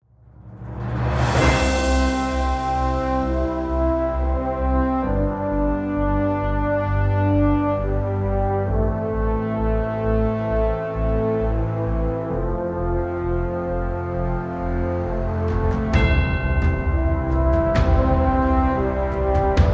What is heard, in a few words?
No Trailer FX No Staccato Strings